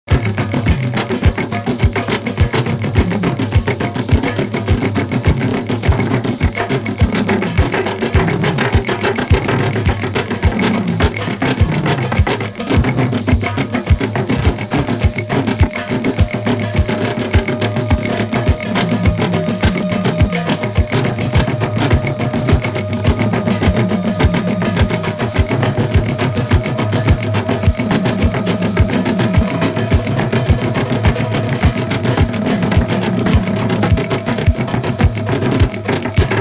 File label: Drum Trance